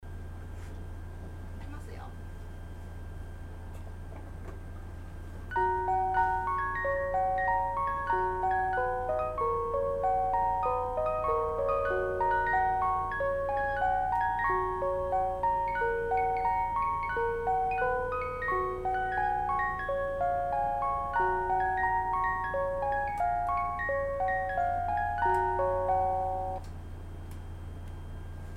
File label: orgel; chitetsu